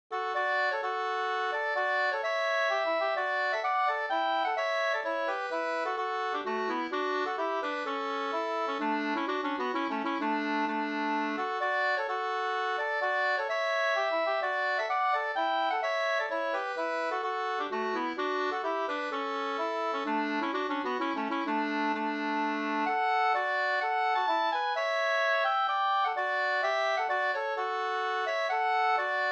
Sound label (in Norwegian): pols Rorospols; Skott-Saraleken no JC 2st